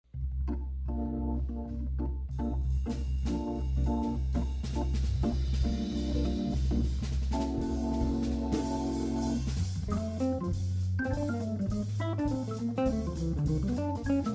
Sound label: Blues, keyboards